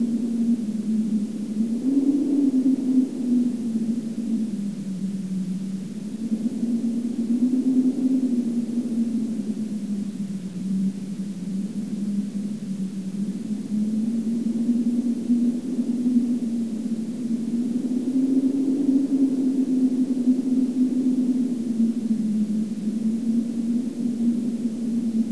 wind; hl1mp; monorail

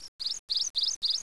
bird; XDM; ambience

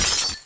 glass, debris; XDM

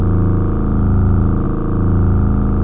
hum; XDM; ambience

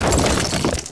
concrete, debris; XDM

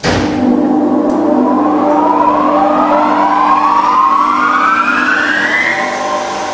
30kW 50Hz motor start; XDM; ambience

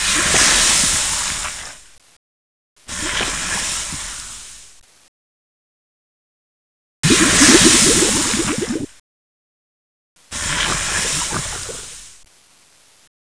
lava; valve

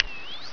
aim on, weapons; rpg; XDM

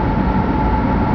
generator; XDM; ambience

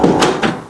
7500kVA transformer stop; XDM; ambience

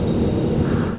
push glass; XDM

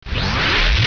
returned; ctf; XDM

